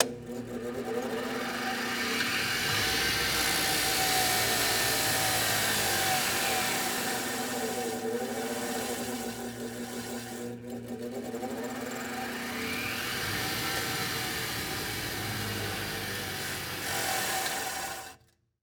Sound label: Industry Tool Grinder Bench Grinder Run On Run And Off